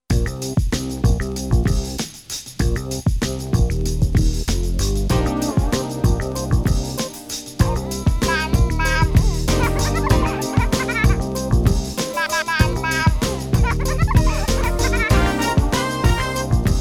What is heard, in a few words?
Baby Funk